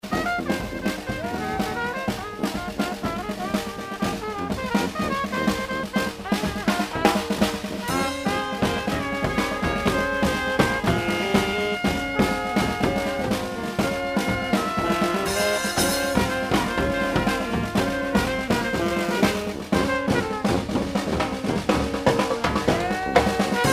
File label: marching band